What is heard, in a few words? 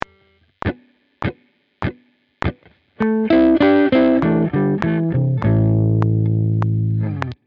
blues, guitar